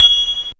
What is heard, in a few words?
Fairlight IIx CMI Finger